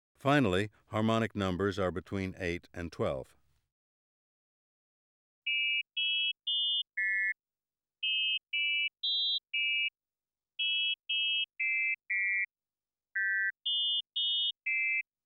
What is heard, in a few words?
Virtual Pitch With Random Harmonics